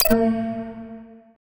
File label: studio logo sound slogan